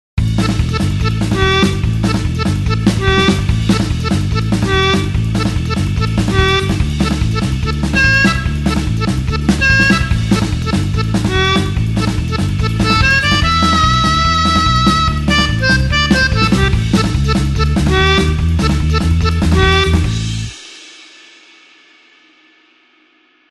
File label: blues harp 8beat A tempo